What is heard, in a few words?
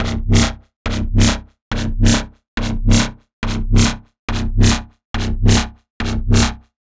140 C BASSLINE, Dubstep; Loopmasters; The New Sound Of Dubstep; BASS LOOPS